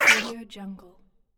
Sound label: alien insectoid monster voice